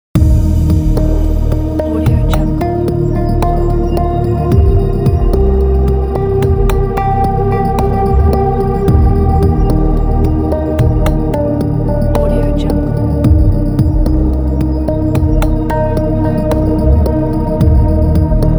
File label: lofi background atmospheric loop